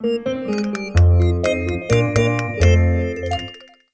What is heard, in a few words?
Jazzy alert; Samsung SGH-i320